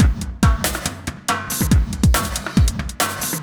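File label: industrial 140 kit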